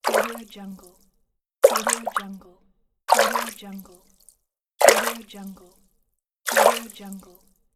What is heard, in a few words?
splash water; blackdragonfx